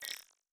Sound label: ui page entrance